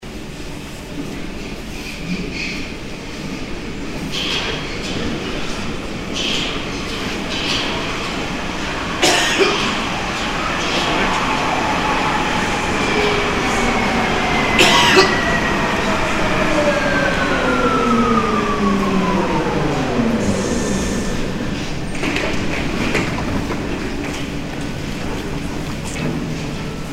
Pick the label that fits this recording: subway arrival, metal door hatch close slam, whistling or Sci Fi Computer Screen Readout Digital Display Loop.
subway arrival